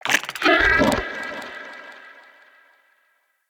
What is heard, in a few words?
Shrimp Vocal Pain, Creatures; Vocals; Baliq Shrimp Glade